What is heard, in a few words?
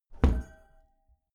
glass door close heavy glass clang